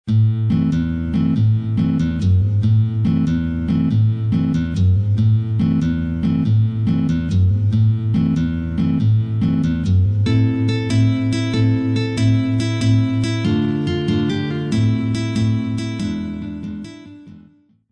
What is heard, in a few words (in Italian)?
tarantella napoletana chitarra